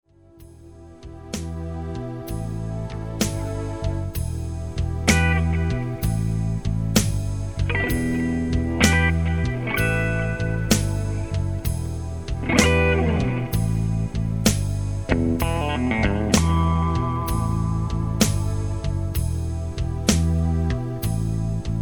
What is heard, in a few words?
slow